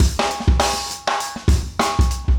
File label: funky 100